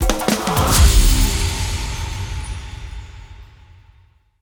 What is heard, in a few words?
big win start